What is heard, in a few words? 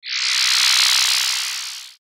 Sci Fi Laser Ray Energy; Lasers